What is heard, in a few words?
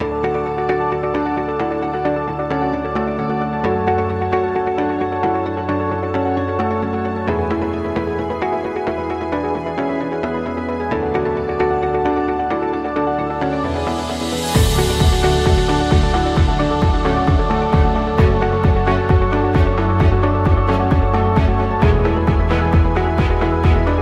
Motivational